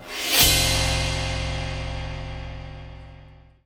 Cymbal Swish; Sonic Foundry (Sony Creative Software); The Electronic Music Manuscript Collection

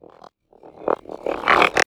glitch foley, bass

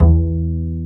PIZZ BASS E2; E-MU Formula 4000 Series Vol. 2

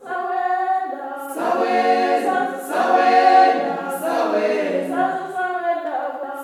SWAY CHR, FEM. CHOIR; Spectrasonics; Heart of Africa 2

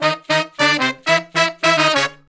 Horn Section

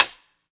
Percussion, House

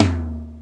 RX5 TOM; Hollywood Edge; The Big Bad Beatmachine

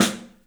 STEEL S, drums; 3D Sound

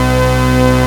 MONO POLY, Bass

